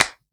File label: CLAP, Dance Drums